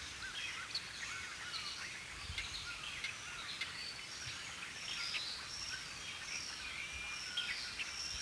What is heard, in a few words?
Amb Birds, ambience; Star Wars Obi Wan; XBOX; Theed